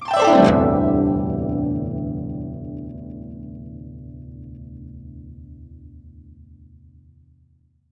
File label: GUCH GLS, GUCHIN; INIS - Opium; GUCHIN-GLS